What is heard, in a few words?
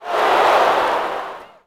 Ooh, Crowd, reactions